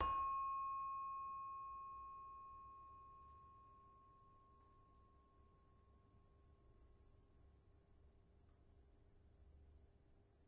Saron, Indonesian Saron; WORLD

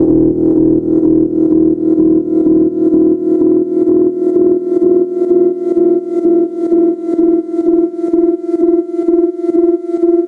harmonic pulse train; LOOPING AMBIENCE

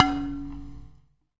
Clay bowl, ETHNIC and WORLD PERCUSSION

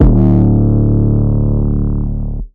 Crunchy 808; drums single hits; Khezie 808s

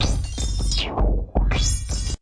rhythmy fx slidey, Electro and Synthetic

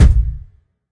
thick kick; Hip Hop Specialty Kit; STYLE KITS (Hip Hop, Etc)

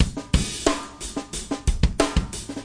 092 funki classic, drum loops and breaks; 091 to 100 bpm

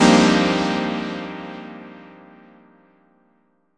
stab satisfying

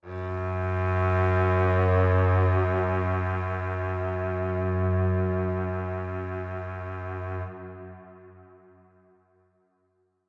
Cello Verb F sharp 1, Verby Solo Cello

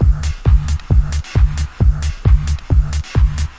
round techno, drum loops and breaks

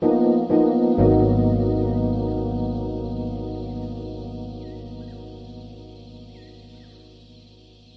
chill epiano 7ths with cric; KEYS ORGAN PIANO

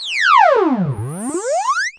Electro FX; Ueb HE Electro FX

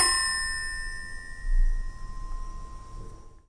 garfo bell hit; Gongs and Super Crashes; single hits

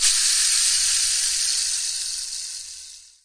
rainstick performance; Rainsticks

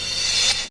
backwards in crash; Gongs and Super Crashes; single hits

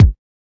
kick

